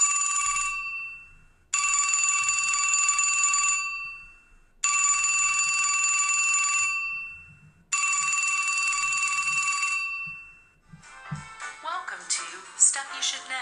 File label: RAZR speaker performance